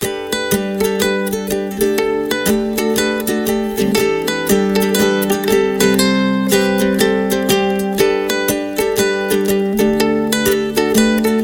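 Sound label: Ukulele Happy Loop, ringtone; Uke Happy II (LOOP); MIUI14 and HyperOS 1.0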